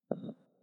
3D Put Down; Martian Chess

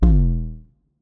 3D Invalid Move; Martian Chess Audio

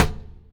impact Plate medium

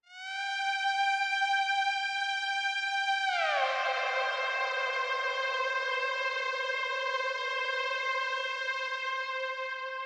Lead Synth; Martian Chess Audio

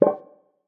Pick Up Piece; Martian Chess Audio

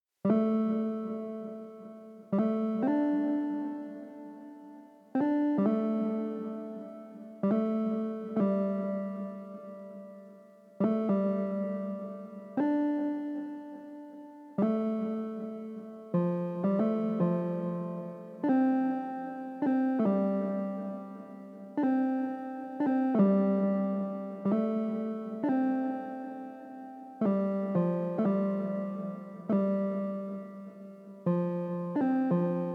Synth; Martian Chess; Left Synth

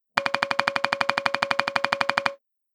Clicky Treespeak Multi; Talking-Text Roll

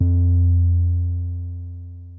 Drum BPM110; Martian Chess

